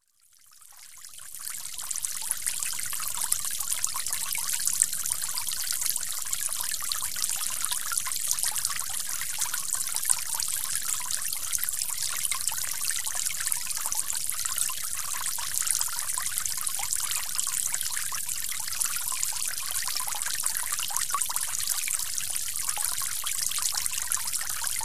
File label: Mountain stream flowing; river